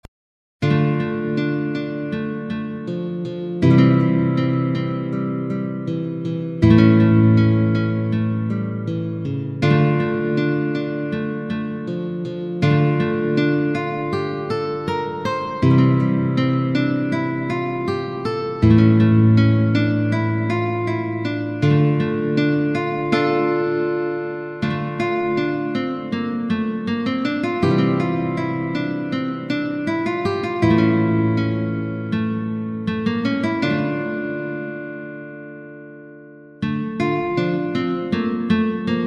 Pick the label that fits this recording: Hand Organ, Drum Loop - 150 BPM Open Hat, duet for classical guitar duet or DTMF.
duet for classical guitar duet